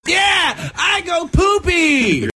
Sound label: Is Calling Inspirational